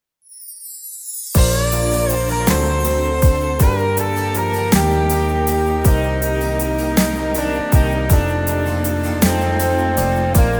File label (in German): Chor; Tropinka Chor 2015